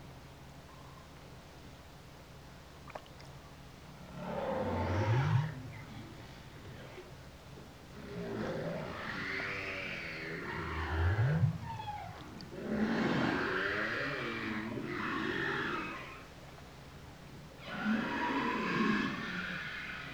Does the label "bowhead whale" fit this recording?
Yes